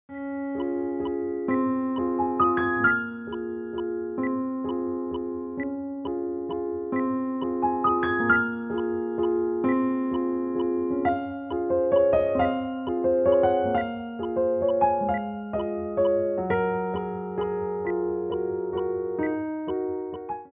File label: piano, impromptu en Db